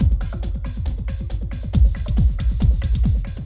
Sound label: Clubbin' 138